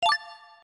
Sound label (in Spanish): CLICK SIGUIENTE